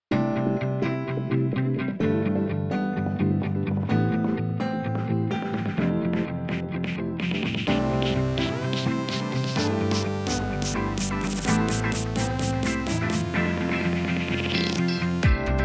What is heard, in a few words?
dance pop